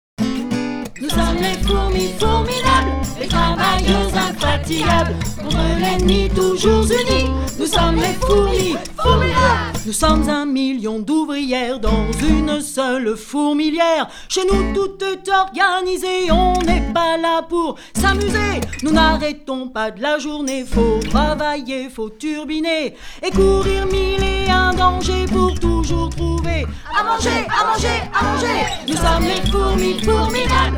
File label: SONG